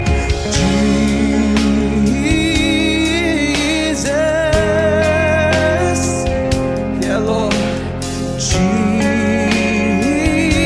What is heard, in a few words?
Jesus Song